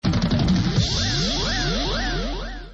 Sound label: jingle info; Le Hit des Clubs nov 90